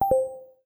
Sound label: circle lock, ui; LG Swift L9